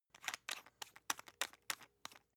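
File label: toothpaste open loose, Bathroom; haus-garten; badezimmer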